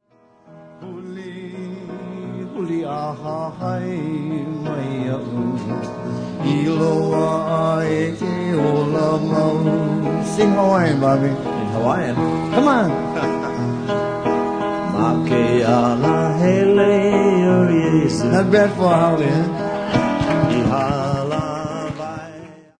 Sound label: duet